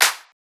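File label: Clap Progressive House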